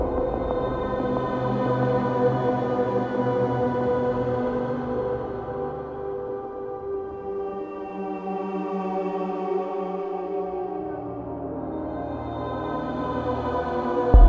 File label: drama door seamless